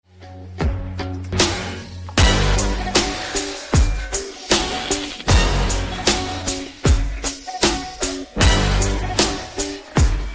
Instrumental; LoL

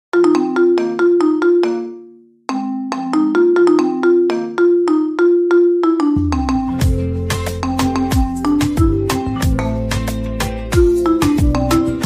Marimba Remix

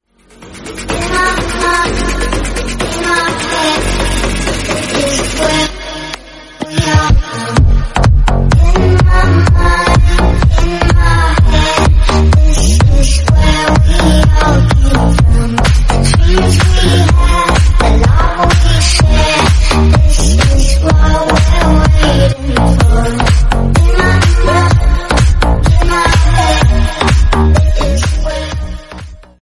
English Song

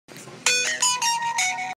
ringtone meme; Samsung

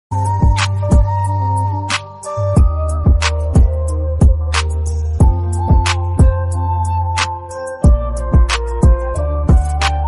Sinhala Background Music

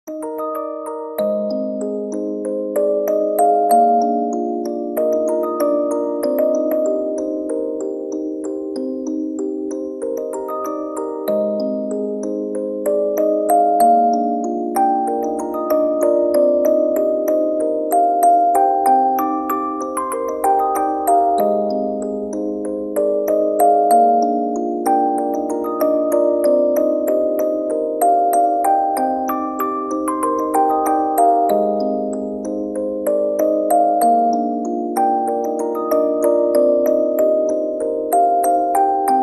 Music Box

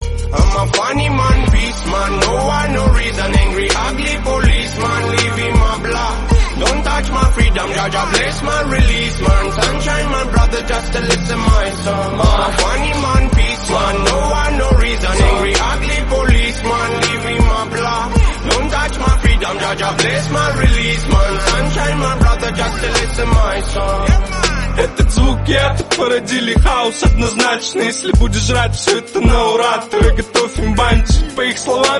Russian Song

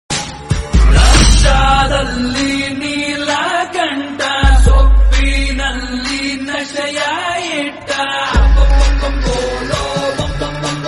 Tamil Song

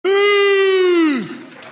Huuuuu

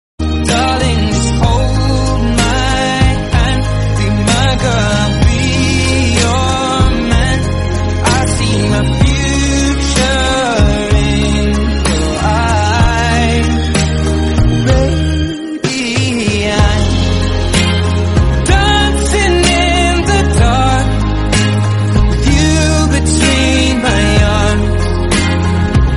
Love Song

